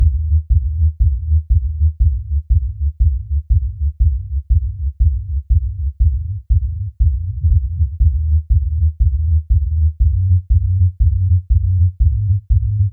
Lobby (Subwoofer); Inkopolis Plaza

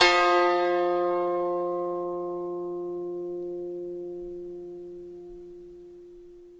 koto; Summer Catchers

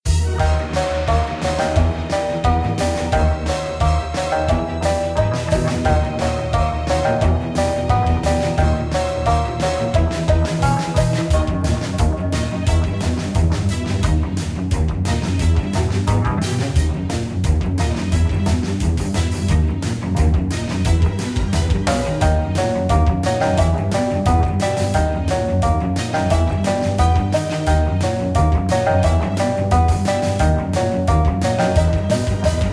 ninja horror

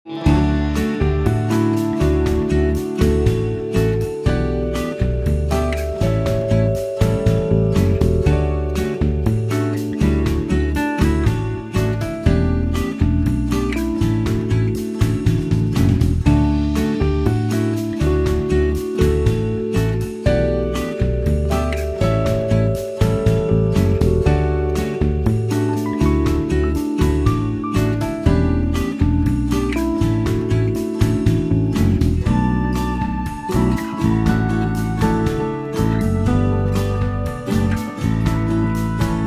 Inventory; Summer Catchers

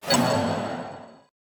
Cemetery Card

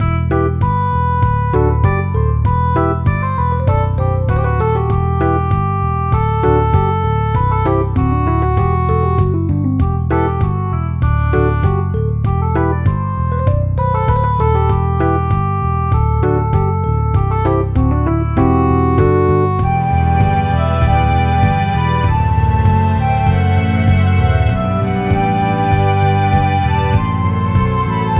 BGM; Heroland

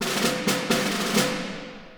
Boss Zone Music; Itadaki Smash

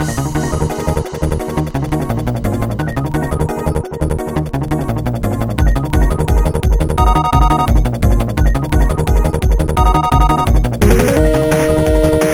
battle; SanctuaryRPG

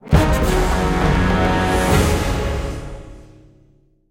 Terror Sting; Jurassic Park The Game

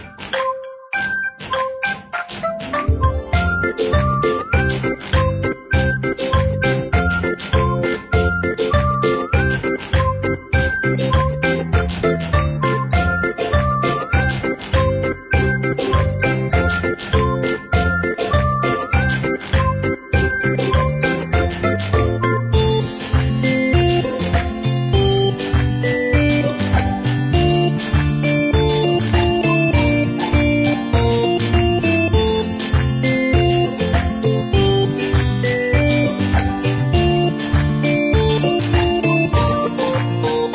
Level Theme

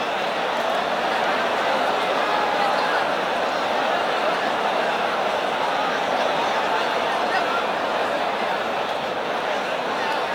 Hub Orleans Ambie; Levels Hub Orleans 03 Dance Street; Hub Orleans Ambient Crowd

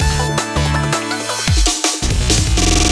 Transition; sky rogue

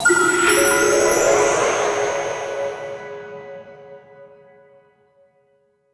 SOUL JINGLE

